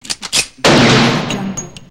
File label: gun shot; klassikua